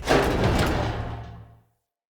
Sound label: Pollution Tower Elevator Start A